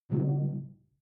sci-fi hud refresh; maschinen; signale; soundpool

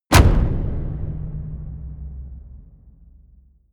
hit wood, hits impacts

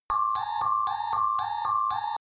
sci fi alarm beep room; soundpool

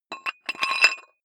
dumbbell thread put on weight, fitnessstudio

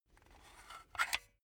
silicone gun empty pump slow; soundpool

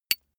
small metal impact medium high damped; industrie wirtschaft; metall

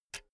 pull out jack cable; objekte; elektronik

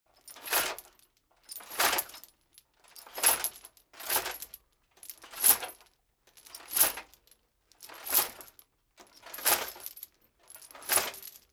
metal chain movement on plate sequence

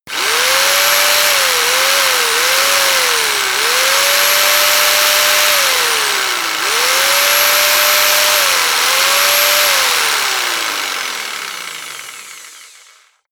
jigsaw engine sequence; maschinen; saege

